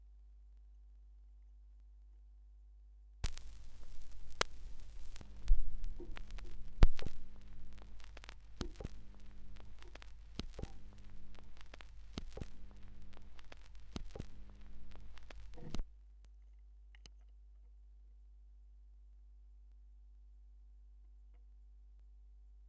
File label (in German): schallplattengeraeusche; rauschpartikel